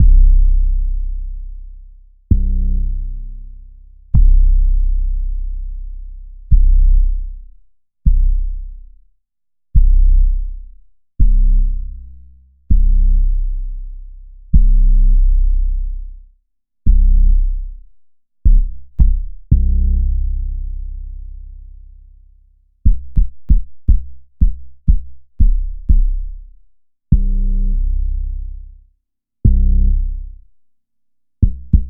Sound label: 808 Processed; Sliced